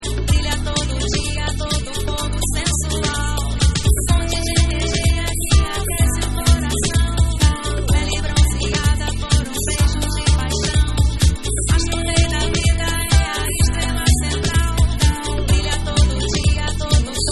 SENSACAO Guitar Mix

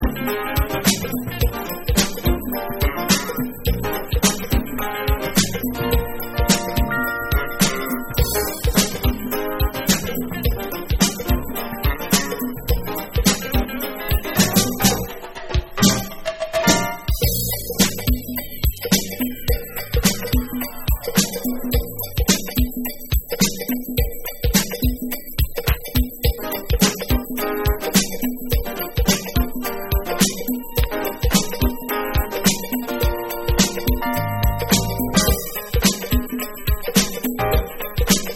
Instrumental

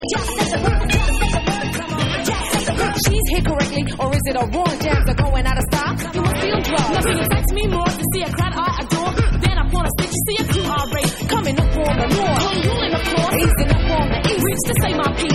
Hip Hop Mix

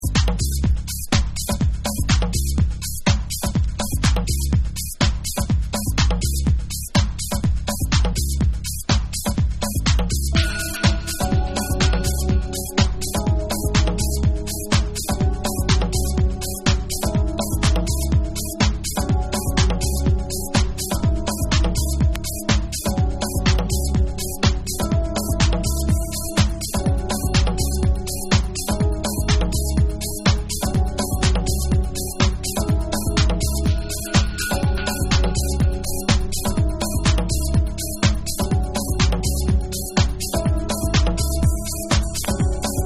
Groove Instrumental

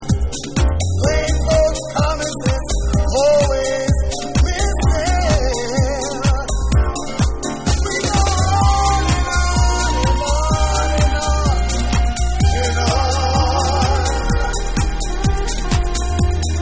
Tuba

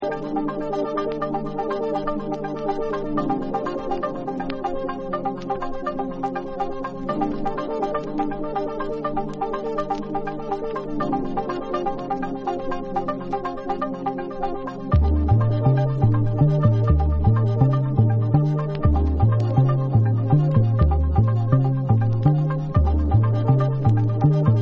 Ambient

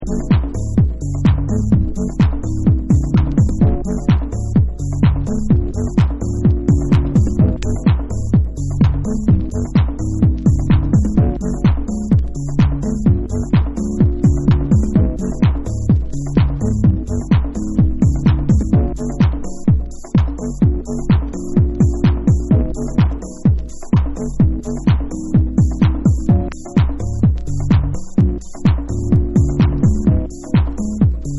Instrumental Dub

